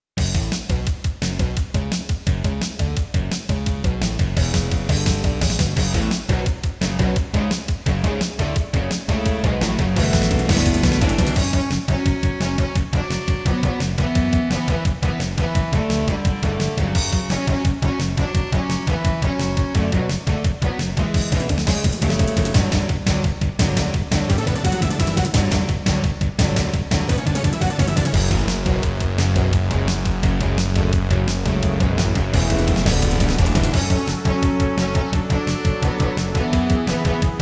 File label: Intro, Yamaha MU90; Duke Nukem 3D